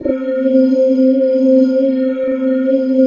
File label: PAD, Drum & Bass, 160 BPM